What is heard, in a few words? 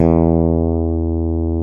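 rock fretless E3; Drums In Your FACE!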